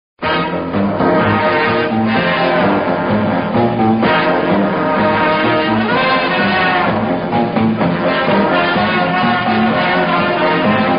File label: classical detective music tone